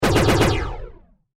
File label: Science Fiction Laser Cannon Four Shots Gun